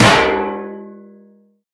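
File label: hit on a metal disc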